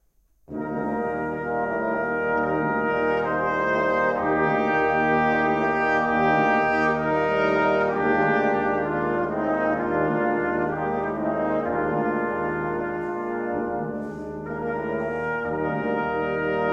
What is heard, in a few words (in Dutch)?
Quintet Variatie; Ewald; Quintet 2 opus 6